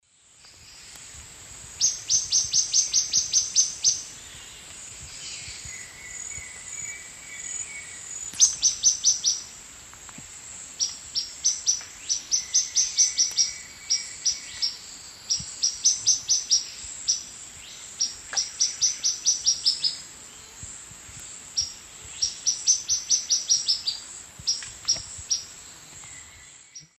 Xenops minutus, call; S. America; Eastern Lowlands

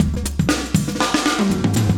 120bpm loops; Sound Effect Library

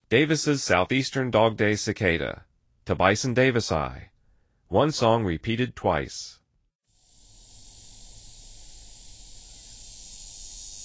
Davis's Southeastern Dog-day Cicada; N. America

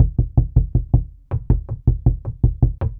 JUNKYARD RHYTHMS

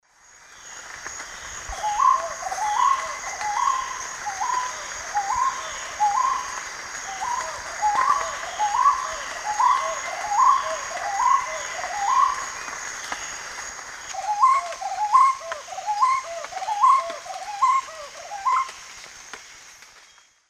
Odontophorus gujanensis, group song; S. America; Eastern Lowlands

